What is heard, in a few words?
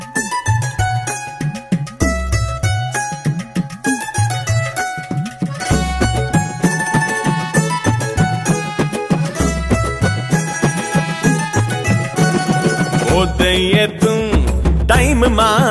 music ringtone